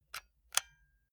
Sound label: console controller xbox rt press slow